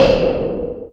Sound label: Synth Perc; Dream Zone